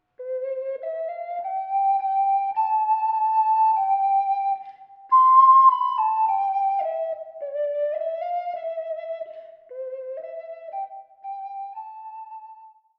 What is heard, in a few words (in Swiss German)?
chaemifäger, ocarina